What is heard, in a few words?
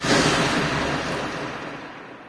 inside battle antlion, prison; ambient; source engine; hl2; video games